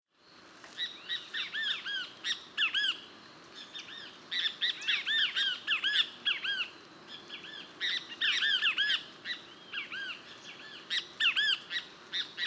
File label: Pitangus sulphuratus; costa rica 2013